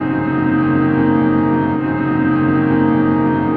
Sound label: Piano Blur